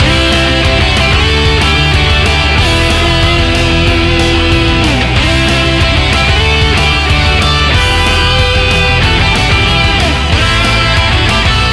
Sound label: rock, bgm; pictcode